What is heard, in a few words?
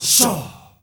SHAWSHAAA L, GRP CHANT, Heart of Africa; Spectrasonics; Heart of Africa 1